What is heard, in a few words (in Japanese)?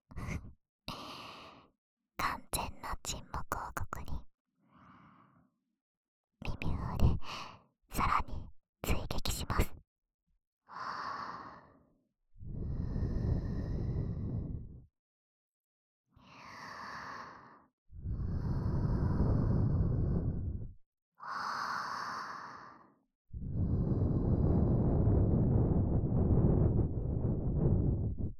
右 耳ふー, ASMR